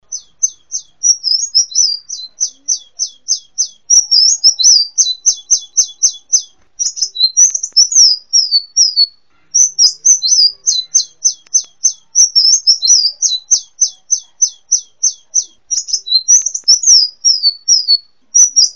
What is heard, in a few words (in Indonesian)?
kolibri muncang